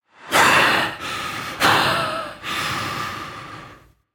breath; smite; gilgamesh

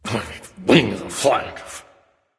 grumbling; smite; voicepack; ullr

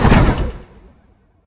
horse breath; smite; guanyu

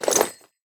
armor heavy; smite; chiron

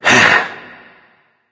sigh; Smite; baronsamedi

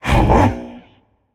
confused, voicepack; smite; jorm

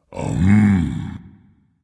content dragon; fafnir

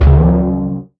Step; Smite; god Kumbhakarna

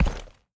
mvt footstep; smite; gilgamesh

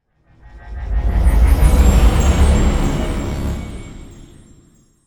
death; smite; god sol

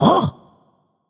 notice; danzaburou

